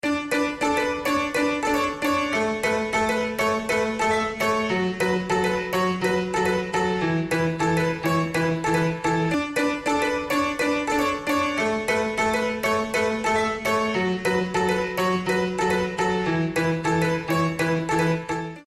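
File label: instrumental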